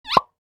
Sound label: Share button click; shorts sound effects